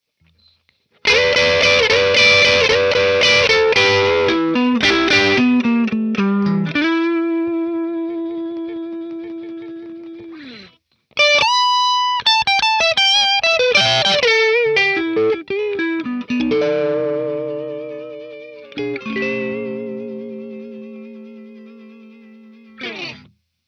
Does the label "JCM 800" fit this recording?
Yes